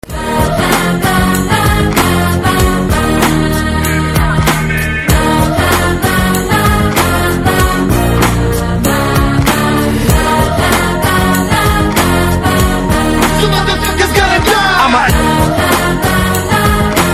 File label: BGM Ringtone